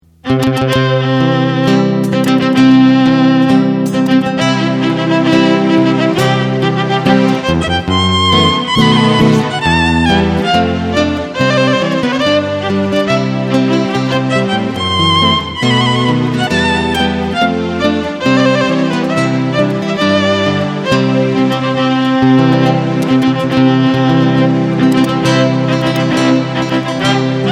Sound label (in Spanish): Marcha Nupcial Guitarra Violin; Ensamble: Guitarra & Violín